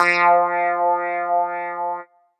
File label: clav F; Polyend; ARC Noise